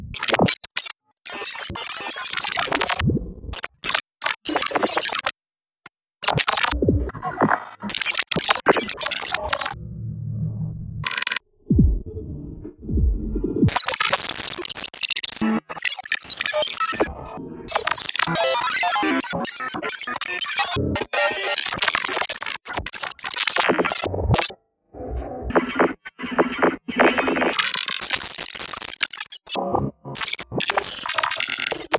glitch gore

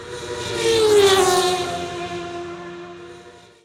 atmo; Techno Trance Essentials; FX & ATMO

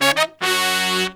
Pop & Funk Brass

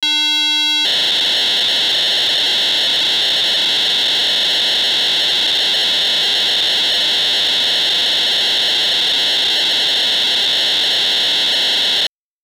PO-32 data transfer sounds; Tonic Drums

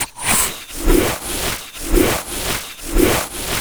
SFX, Drum & Bass, 200 BPM; Ueberschall; Drum & Bass Resonance

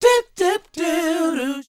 SHOO B F#C, R&B & Dance, Vocal; ILIO; Vocal Planet VOL-4; SHOO BE 80

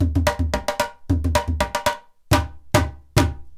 IJEILU GROOV; Best Service; World Colours

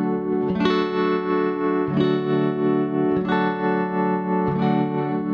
Lap Steel Clean 90 D, guitar drone

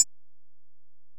EPerc, Alesis DM-PRO; 300 Drum Machines

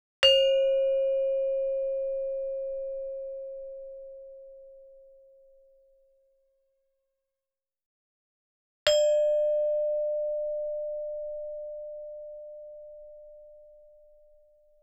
Gamelan; Propeller Island - Complete Gamelan

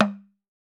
TAM TAM, African Drums

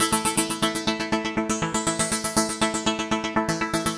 Syn Arp; Dr. Fink's Funk Factory

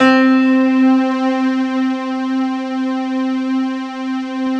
PIA Piano String; Sound & Vision; Gigapack I CD 2

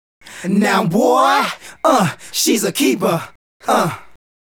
Male Group, Vocal

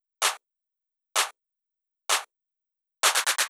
Drum Loop 128BPM Clap Add; VEC5 Drumloops; VEC5 Multi Loops; Loop Elements

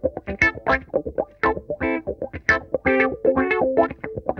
SYNCO, Funk Guitar; Zero G - Funk Guitar

